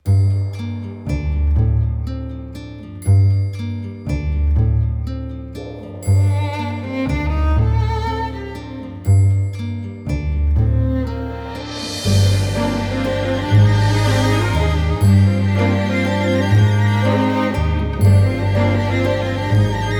valse elegante

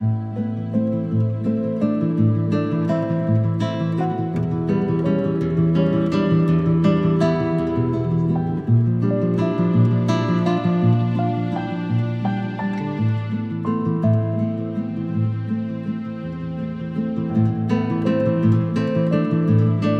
valse classique

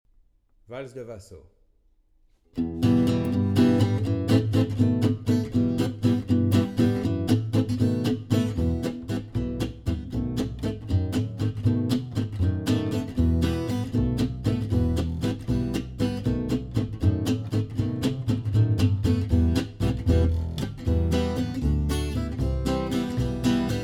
valse

